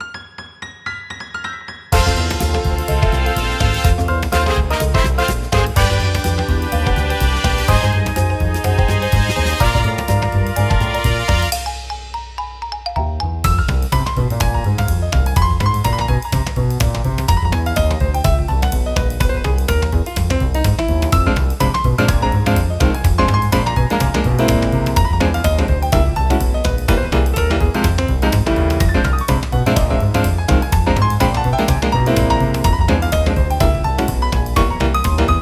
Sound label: Jazzy